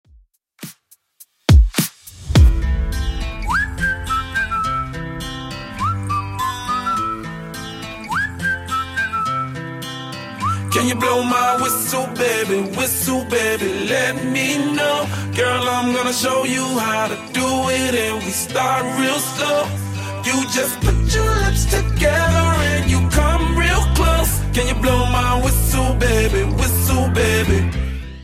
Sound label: 2ks Redrum 104bpm